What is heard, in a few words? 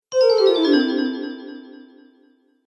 Slow Cartoon Magic; Magic Sound Effects